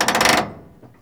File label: PARKING Brake